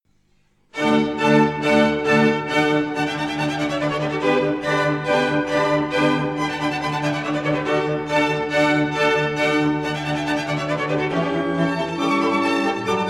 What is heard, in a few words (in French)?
Sonate; K. 69 en ré majeur; W.-A. Mozart